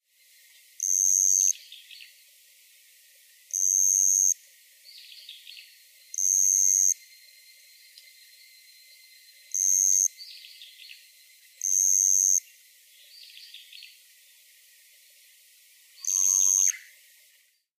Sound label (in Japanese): ezo suzu